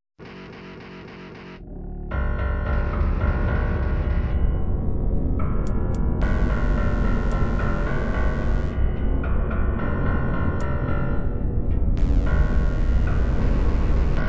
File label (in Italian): Esperimento sonoro horror; Horror - Sedicesimi di tono